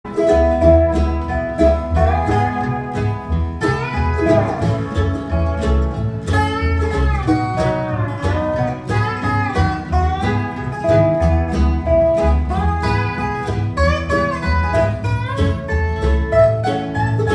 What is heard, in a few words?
Dobro